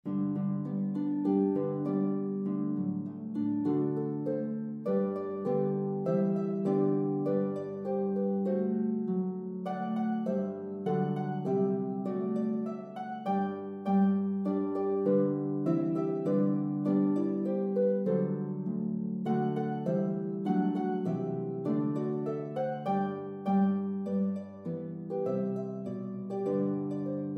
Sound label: Harp Duet, dance melody with variations; This is an 8 bar, rhythmically fun, dance melody with variations for an early intermediate harp ensemble.